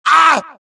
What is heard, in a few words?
death, dialogue, Allies; mohaa; Mission 1